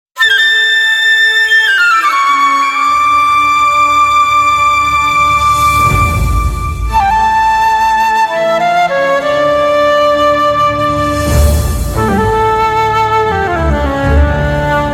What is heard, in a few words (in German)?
Toccata in D-Moll; BWV 565